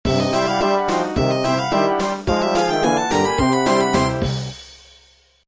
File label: Jingle; funa